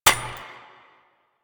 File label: Bird Single Step, Ambience; bird three single step